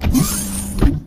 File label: door; dn3d